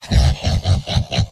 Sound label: m laugh evil; heavy; tf2; garrysmod; mvm